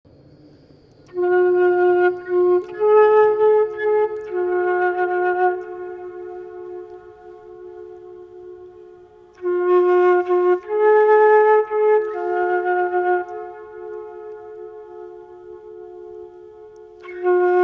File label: Flute